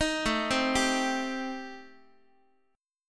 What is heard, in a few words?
A-Minor 7th Flat 5th, Guitar, chord; arpeggiated; JGuitar